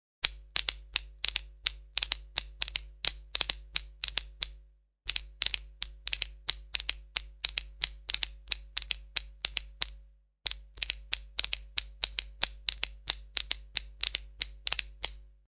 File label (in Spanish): nuez